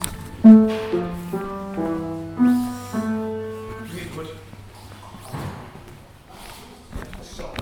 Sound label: piano